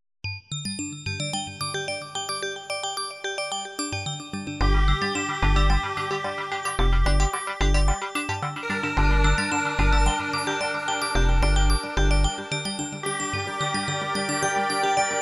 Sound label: Blade short impression; RP Blade; Drums:Punch